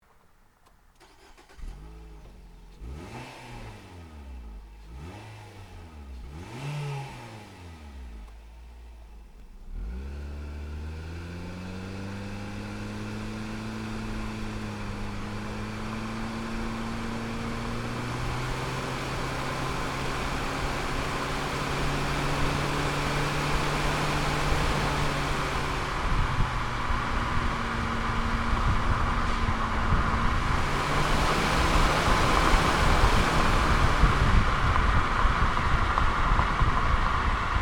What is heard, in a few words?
Nissan Cube